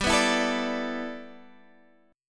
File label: G 6th Add 9th G, Guitar Drop D; down